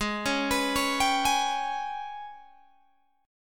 Ab Minor Major 7th Double Flat 5th, down, Guitar, Open G